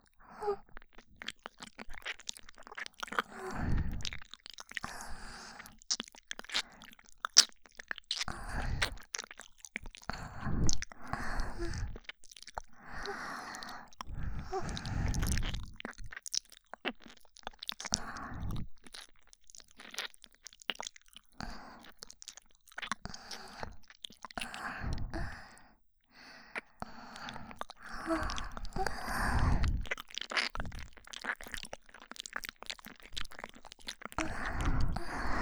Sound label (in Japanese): 耳舐めループ 両耳; いっぱい甘やかしてくれる優しいお姉ちゃん♪